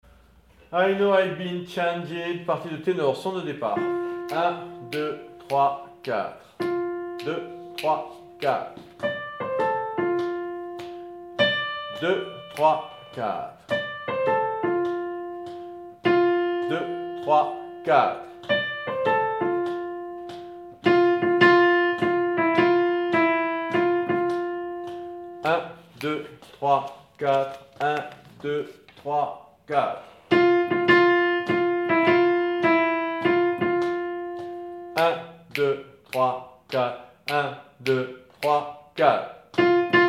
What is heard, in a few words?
Tenor